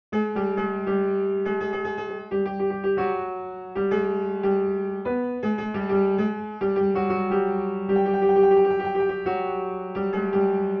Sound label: Chahargah, piano; Mansuri; Radif Mahjoubi